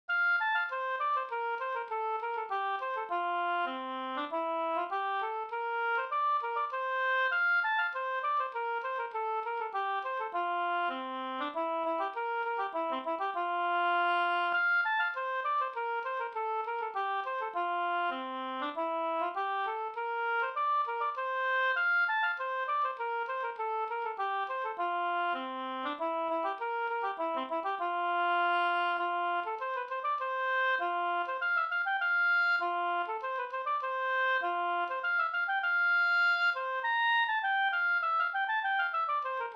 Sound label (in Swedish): polska Bingsjopolska; Bonden och djavulen e Pekkos Helmer